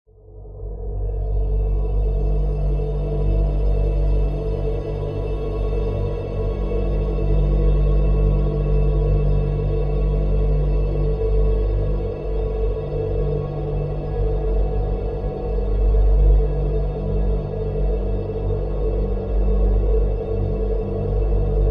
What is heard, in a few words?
horror drone atmos dark eerie tense airy; zapsplat